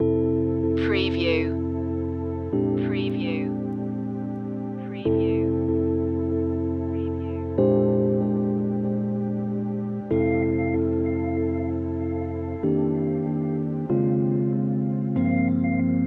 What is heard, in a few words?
spa relaxation no water sound effects